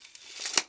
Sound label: Tape Measure Close; Tools; 1001 Sound Effects